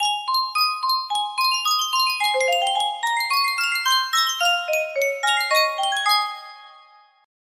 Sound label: Yunsheng Custom Tune Miniature Music Box, music box melody